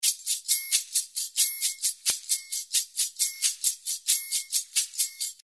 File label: PERC PAN